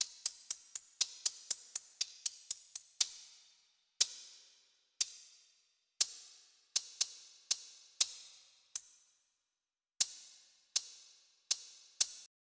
Mexican Maraca

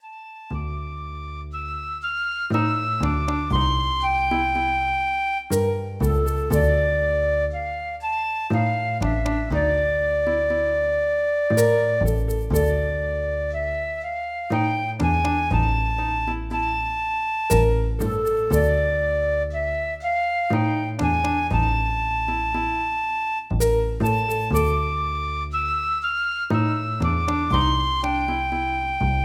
trombet

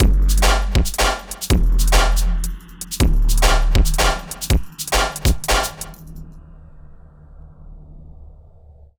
DRUMLOOP D+B 160, Drum+Bass Loop; eJay

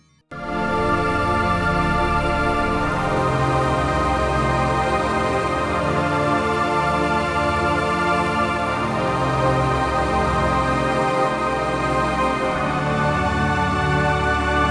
synth; FreelogHS10

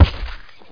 KICK HEAD; Duke Nukem 3D Kill-A-Ton Collection

